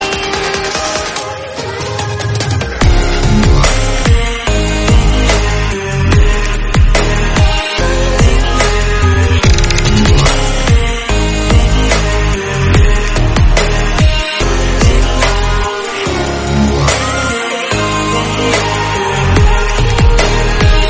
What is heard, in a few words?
music band, instrumental song